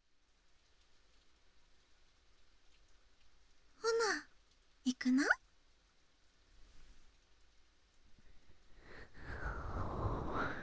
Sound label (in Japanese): 左耳のマッサージ, ASMR